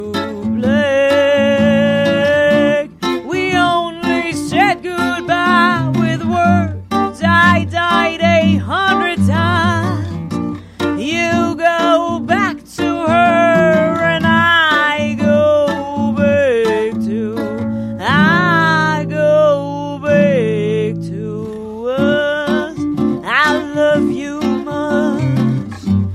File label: Acoustic Guitar; Sprech-Samples